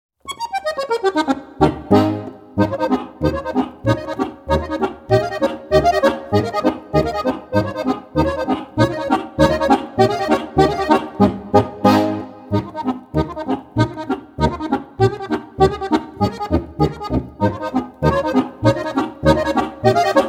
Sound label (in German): boarischer; Michlbauer Harmonikawelt